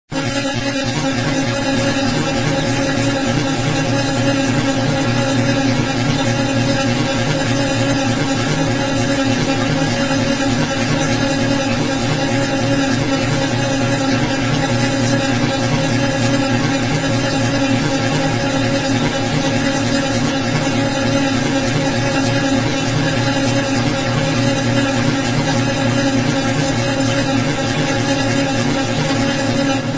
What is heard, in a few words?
RINGTONE